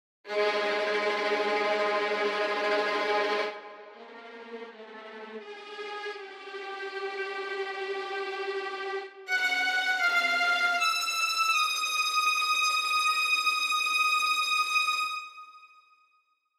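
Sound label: VIOLINI tremolo, violins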